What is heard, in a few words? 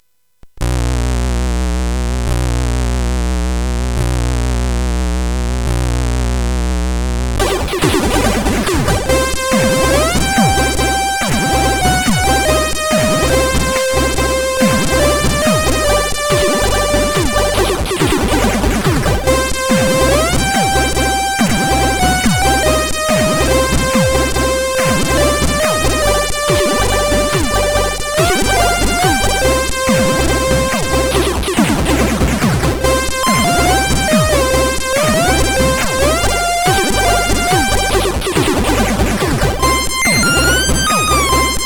Intromusic, sid CSG8580R5; Mad-Max